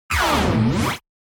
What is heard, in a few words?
Puzzle strategy game menu ui clear